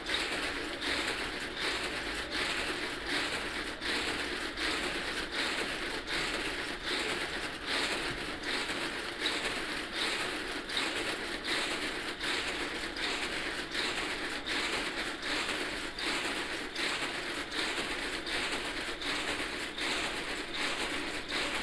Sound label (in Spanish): Persiana Loca, Field Recordings